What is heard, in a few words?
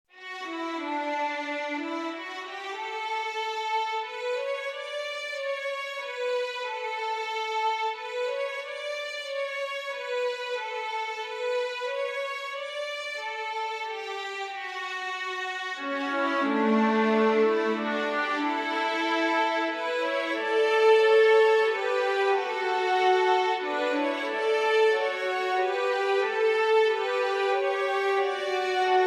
The First Noel INSTRUMENTAL